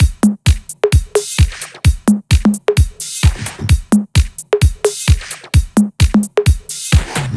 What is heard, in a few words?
130BPM Jig Kit; Vengeance Samples; DK Jig Kit